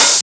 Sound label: Open Hat; FLPRO